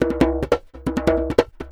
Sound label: Djembe loop 140 BPM; musicradar